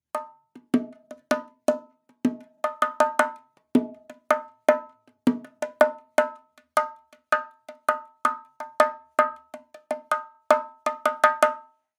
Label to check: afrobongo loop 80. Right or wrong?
Right